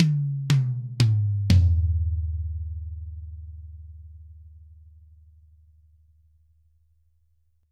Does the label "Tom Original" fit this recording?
Yes